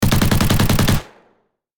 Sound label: Machine gun burst tank turret